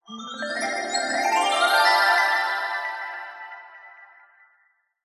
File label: magic glissando 90s style dreamy ascend reveal; fantasy